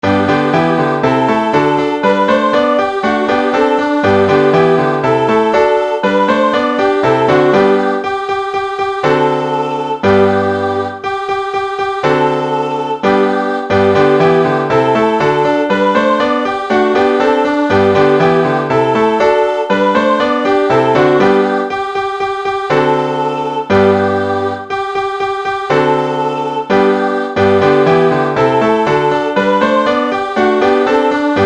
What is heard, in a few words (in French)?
sur le pont d avignon instrumental